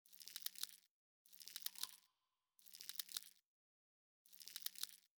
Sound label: ship colossus working looping; biogenesis